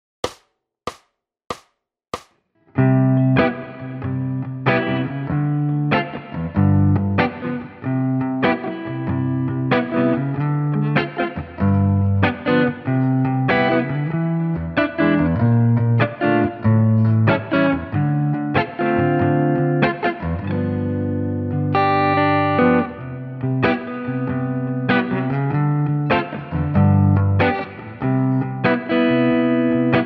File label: Acoustic; Good Little Girl